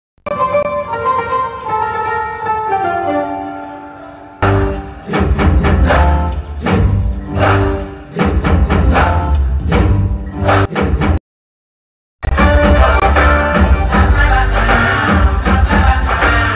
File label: ambajipeta marriage band heroin entry bgm ringtone love bgm